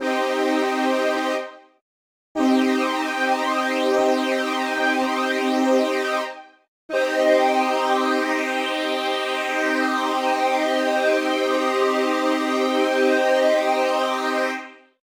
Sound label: Flanging effect; efecto Flanger; Formato WAV